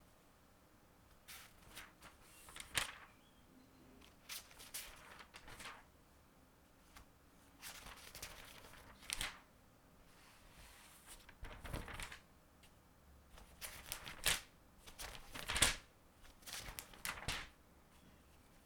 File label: Turning Pages